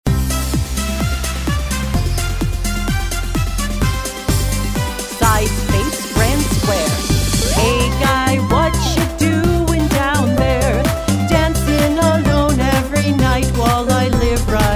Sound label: vocal sample